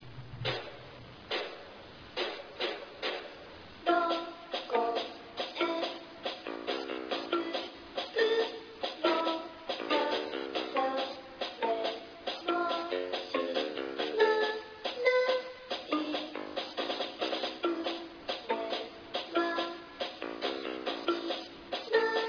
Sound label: taiko